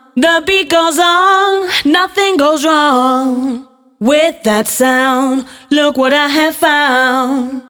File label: Beat Goes, женский вокал